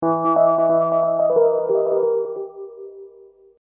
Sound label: Hip Hop Dark Rhodes Licks 90 Bpm Swing E